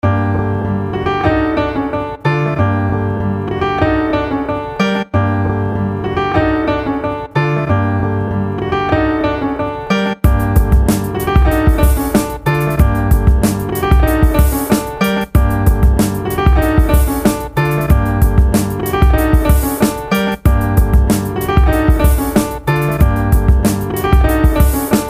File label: Atmospherez